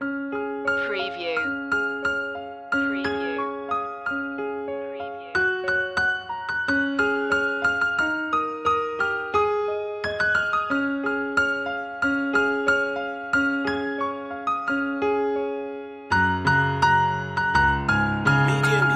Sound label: piano loop; jingle bells; media music now